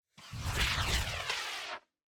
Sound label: Rocket Launch; eaglebos; eaglebossRocketLaunch; enemies